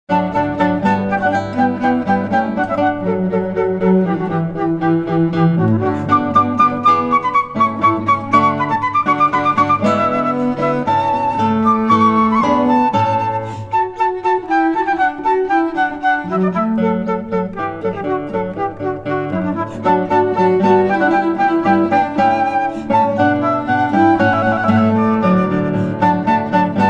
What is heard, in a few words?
Hornpipe Trio